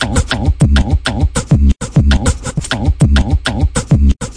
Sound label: 110 vox offbeat louder, drum loops and breaks; 101 to 110 bpm